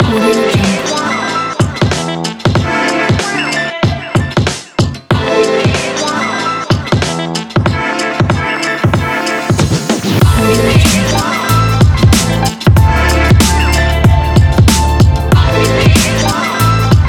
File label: urban funk hip hop